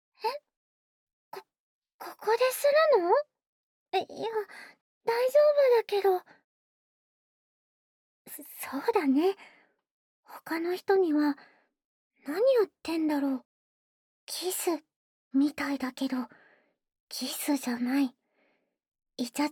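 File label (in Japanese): 口フェラ／導入部; フェラ素材; オマケ 02／口フェラ／A 導入部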